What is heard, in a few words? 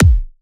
kick, Drum One Shots; dukes; SD MIDNIGHT Sample Pack; Slate